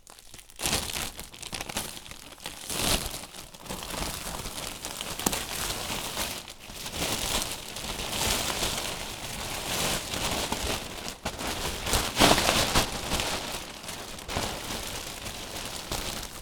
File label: large trash bag